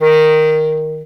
Bass Clarinet D#2; VarazdinOrchestral

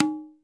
TABLA; PERCUSSION

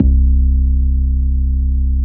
BASS MONO

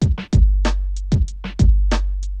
RAP 95; 0- 99 BPM

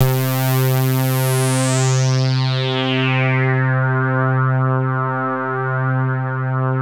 BIG SWEEP C4, SYNTHS; SE-1

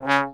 Trombone staccato C#2; Orchestral; DirectWave; Varazdin Orchestral

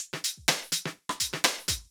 DEEPHOUSE BEATS 125

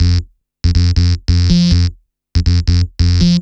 ELECTRO BASS 140 A

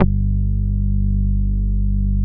hip bass G2; SE-1 BASSES

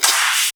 clap snare; Anthemic

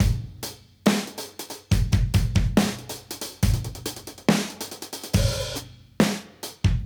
Modern Breaks; Touch Loops; ClassicBreakSessions

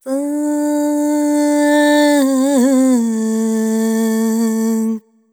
ETHNO FEMALE, Vocals; ethno2

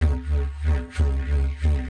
ethno, 125

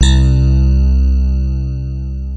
Super Rhodes C3; Orchestral; FL Keys

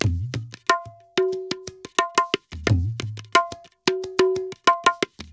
ETHNO EXTRA 90; ethno2

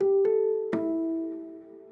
ethno, 125, A

